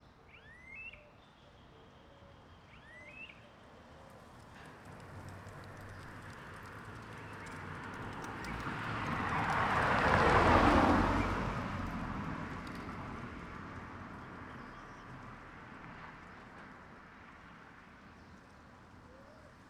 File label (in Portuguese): Carro passando fazendo vento e levando folhas, e passaros; lago norte